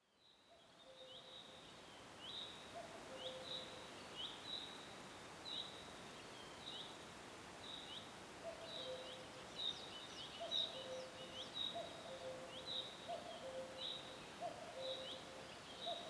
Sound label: forest ambience; magic stone